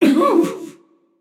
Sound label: grunt hit; smite; jorm